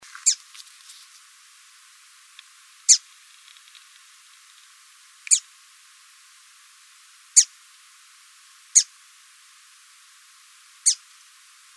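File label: Eastern Winter Wren Calls; WIWR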